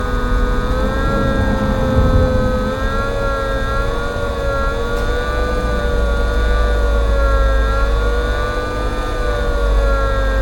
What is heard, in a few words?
Inverter noise